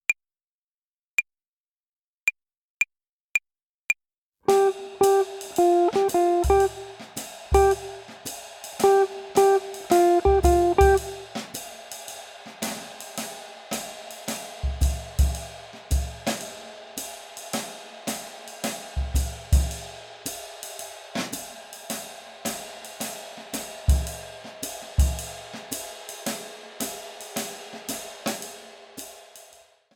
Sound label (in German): Swing-Phrasierung Übung 2, Play-along, Gitarre; Jazz Club